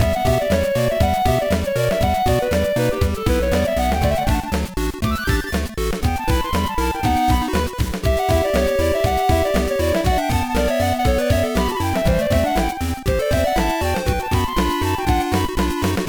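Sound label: background music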